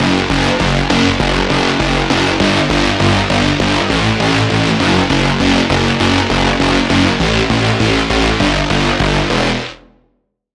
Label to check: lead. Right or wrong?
Right